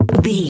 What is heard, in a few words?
footstep woodland